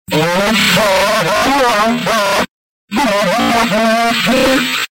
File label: ah; Ah, semi lì durerà, figlia